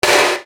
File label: Snare Dr